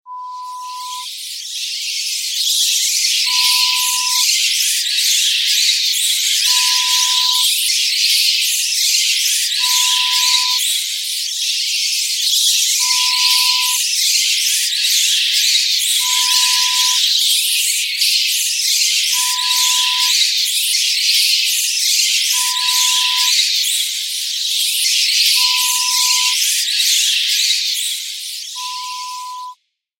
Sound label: Bats Horror; Sound Effect; Lo-Fi